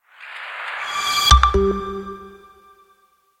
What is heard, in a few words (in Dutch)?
Nieuws Alert; VTM